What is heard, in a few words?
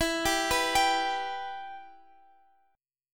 E Minor E down